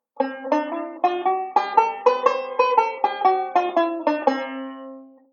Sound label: Bebop Scale, chord melody; Beyond Chord Melody Volume II